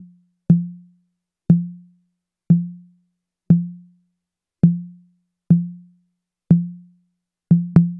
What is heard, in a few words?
Toms